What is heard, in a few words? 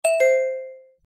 ting